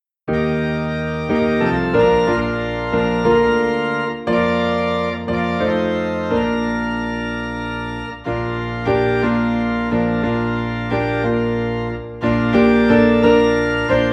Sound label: Piano and Organ in B Flat; Love Lifted Me